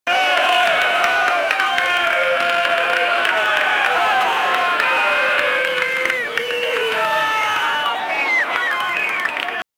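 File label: an energetic stadium crowd, chanting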